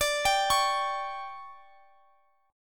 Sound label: D Major 7th Suspended 4th, chord